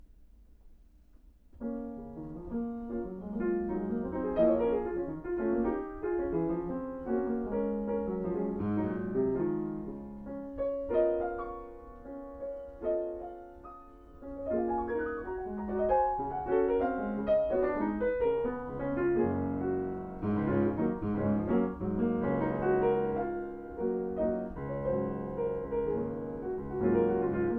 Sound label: mazurka, pianist; alexander scriabin